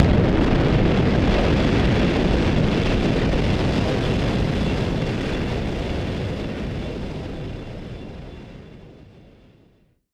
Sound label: Noise Bomb Harmon; Noise Bombs; musicradar; bomb factory